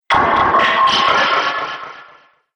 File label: Science Fiction Laser Beam Metal; Sci-Fi; Andrew Fleming